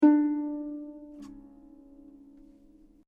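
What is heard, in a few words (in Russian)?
арфа одиночный этнический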